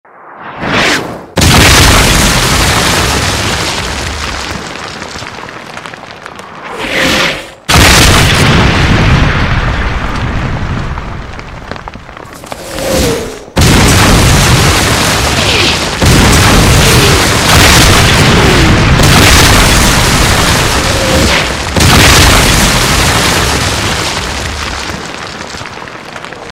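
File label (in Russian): artiller obstrell, звук артиллерии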